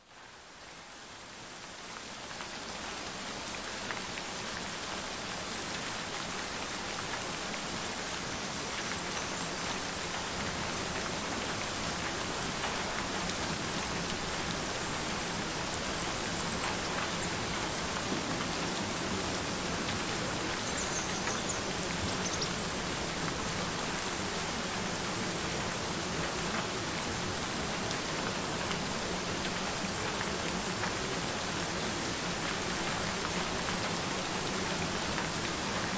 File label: Rain Sounds Subliminal Affirmations Frequencies